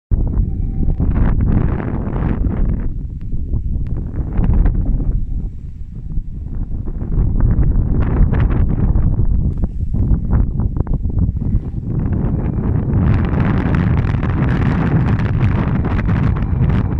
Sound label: wind; Modulkoffer